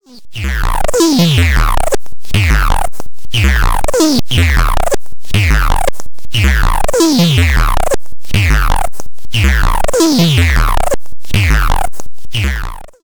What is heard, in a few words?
Anorganic Pulse; AudioThing Lines